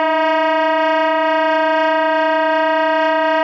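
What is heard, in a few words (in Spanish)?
Tremolos, saxofón multifónico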